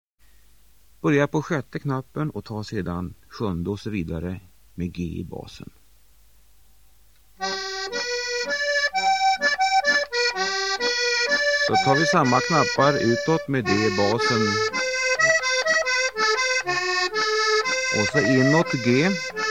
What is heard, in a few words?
improvisation